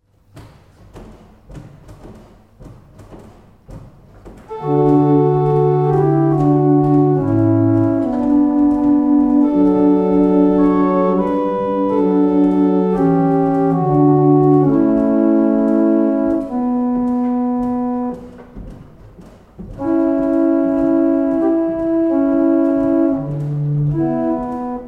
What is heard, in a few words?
Double chant, barrel organs; Letheringsett